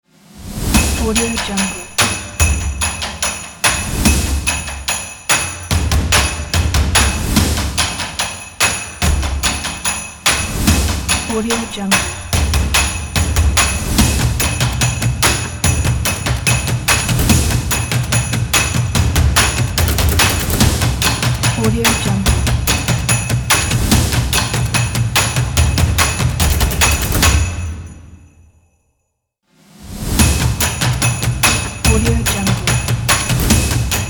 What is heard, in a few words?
christmas stomps and claps